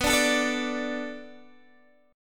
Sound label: B Minor 7th B Guitar DADGAD, chord